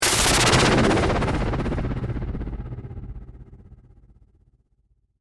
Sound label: Whoosh Filter, Flutter; Whooshes; BlastWave